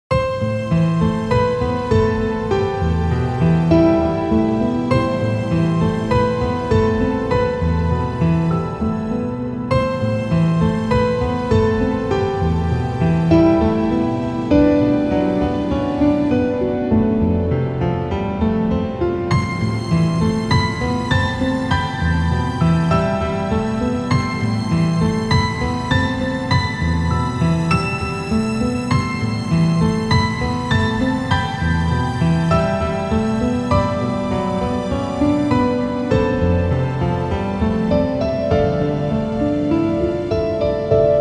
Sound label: Piano Cover; Ori and the Blind Forest